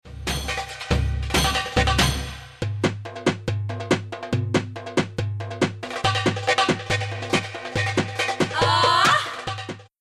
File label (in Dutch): trommels